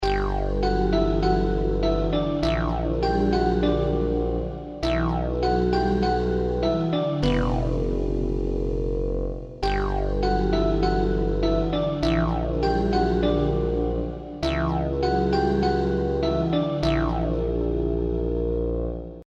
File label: BGM Gameover; SimpleRPG; Android